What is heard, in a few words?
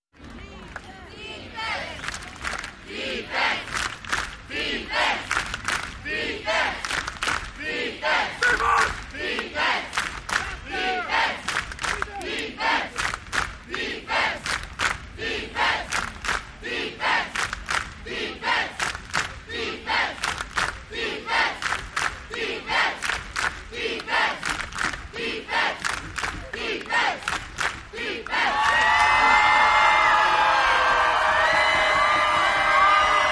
Football Crowd Defe, sports arena football